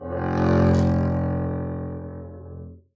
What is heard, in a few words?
arco f1 ff down, double bass; dsmolken